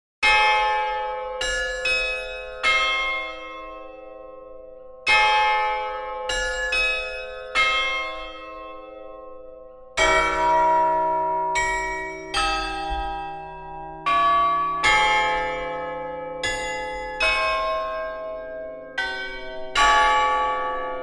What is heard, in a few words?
Bell Carillon; Carillon de Gand; kiyoshi konoyoru